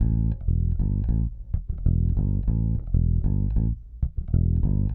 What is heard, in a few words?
Electric Bass; Basses